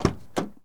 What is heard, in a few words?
Mustang Door Open; Automobiles